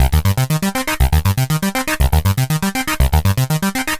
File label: Arpeggio Bass, Prototechno